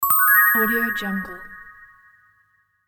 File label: magic game spell